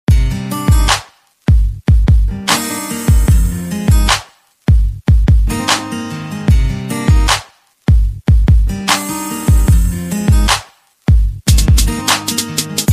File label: Instrumental Music Ringtone